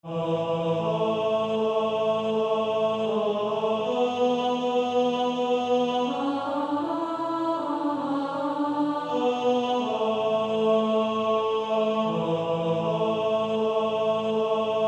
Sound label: Male Choir T1